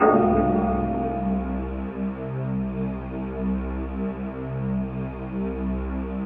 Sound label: Pad; Dream Zone